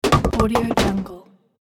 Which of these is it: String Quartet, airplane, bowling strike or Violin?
bowling strike